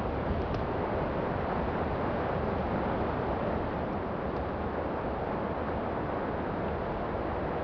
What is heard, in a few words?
wind heavy